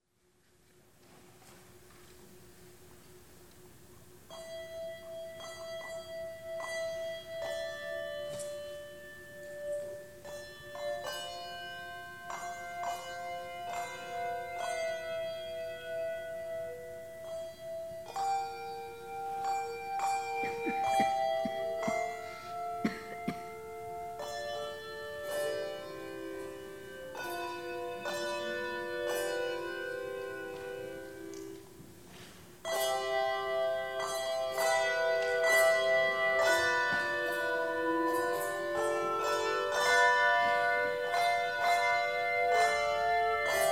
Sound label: Bell Choir